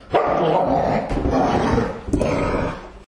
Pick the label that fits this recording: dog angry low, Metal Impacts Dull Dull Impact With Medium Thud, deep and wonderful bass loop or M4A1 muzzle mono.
dog angry low